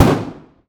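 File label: lmg sup urb int close rear player; gmod; viper; atmo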